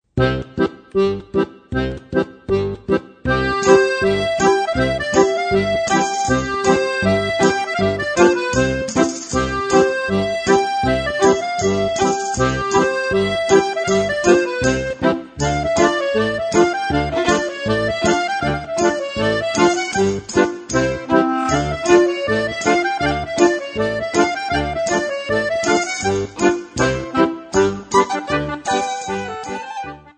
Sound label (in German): Rumänien, Accordion; Joc Murele; Accordion Trip 2